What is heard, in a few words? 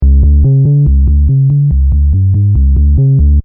Techno Trance Sub Bass Loop In C 142 Bpm; Bass Loops